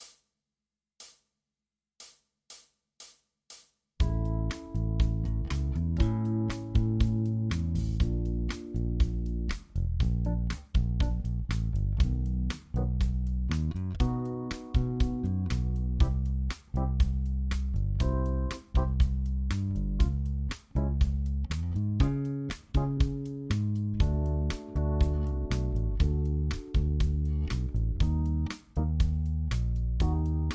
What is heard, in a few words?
Major minor chord regions Pop 120bpm 4 4; 50bars